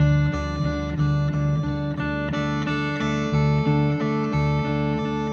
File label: Shift Delay, Guitars; Rock